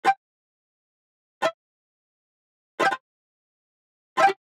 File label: Digital Click Neutral